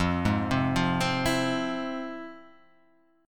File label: F Minor Major 7th, chord